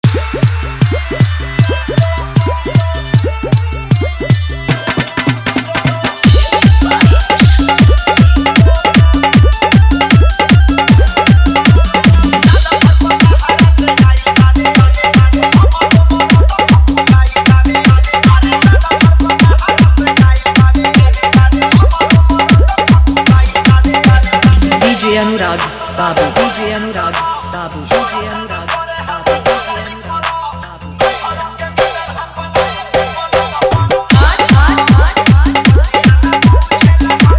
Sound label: Full Dhollki Bass Full Dance Mix